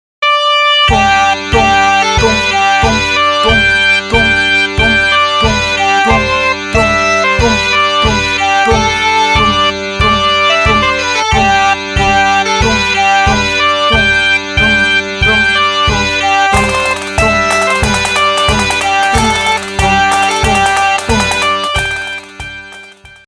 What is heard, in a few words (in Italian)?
Marcia ritmo